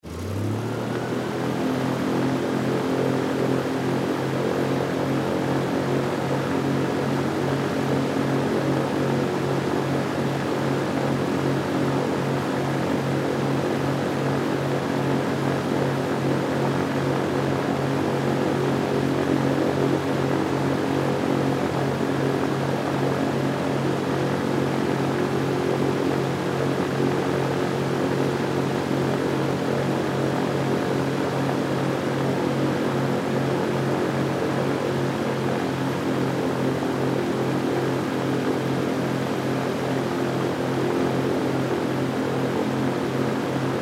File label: Air Boat Drive Around; Transportation Watercraft; Boat Sound Effects; Boats (Sound Effects:Vehicles); Blastwave